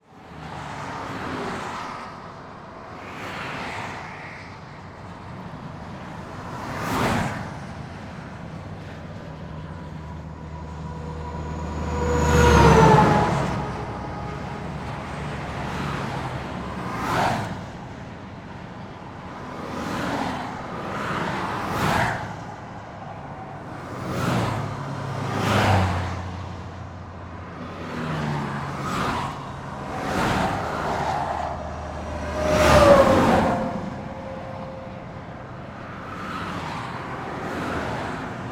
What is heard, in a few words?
Traffic Expressway